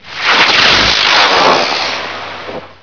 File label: Rocket; American Blonde